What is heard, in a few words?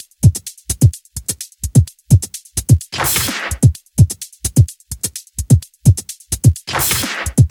128BPM Kit; Vengeance Samples; Rocketeer Kit